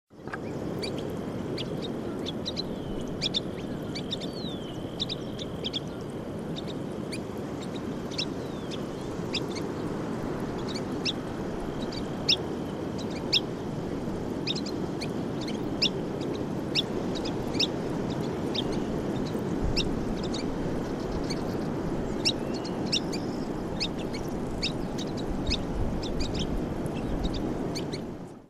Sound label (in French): becasseau sanderling, calidris alba